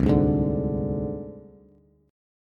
C Suspended 2nd Sharp 5th C Bass; Csus2#5 strummed; JGuitar

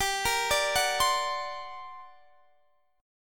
G Minor Major 11th, G

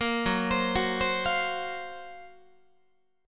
F sharp Major Sharp 11th F sharp Guitar; F#M#11 Chord; arpeggiated; 13,14,15,16,16,16

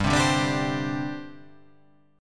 G Suspended 4th G down; strummed; JGuitar

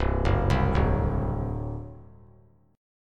C Suspended 2nd Flat 5th C down; Csus2b5 arpeggiated

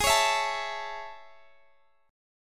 A Major G sharp, Chord

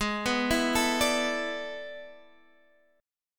A Major 7th Suspended 2nd Suspended 4th

